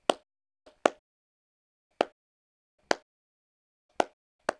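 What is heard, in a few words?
fists; pesti; Mafie 1 cz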